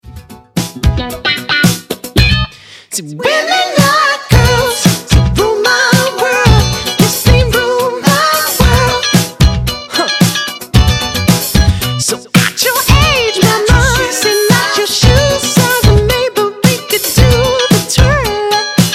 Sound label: Rock Pop Function Band; London